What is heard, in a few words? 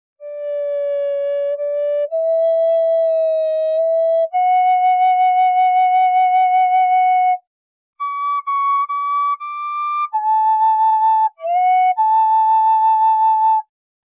Native American Flute Medium B